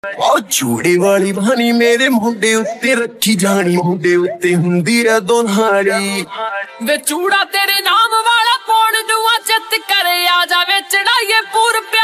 lofi

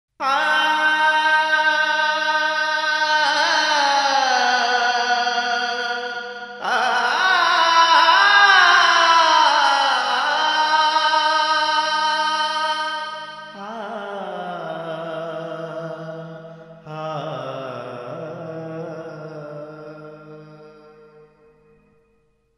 sad alap bgm music

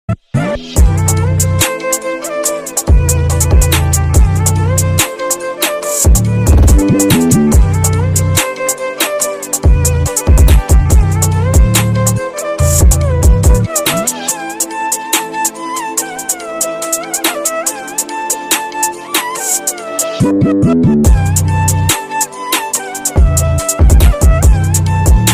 instrumental ringtone drill beats ringtone; aadhi edits

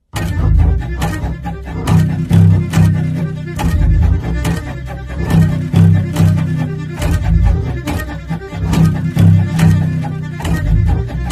angry instrumental bgm; abrar

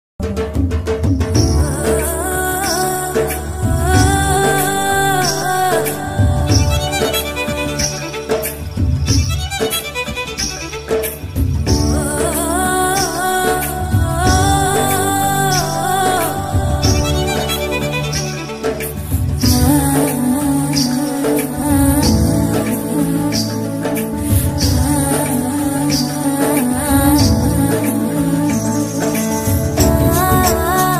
sad bgm; old movie bgms

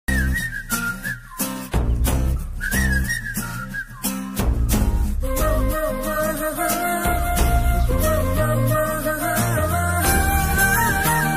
arinthum ariyamalum bgm

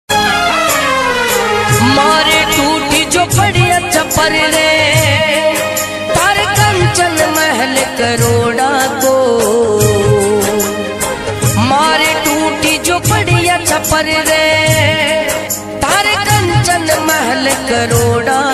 Bhajan Ringtone

